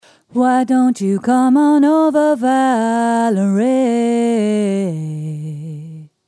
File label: alto ending